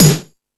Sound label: Ambient Rock, drum machine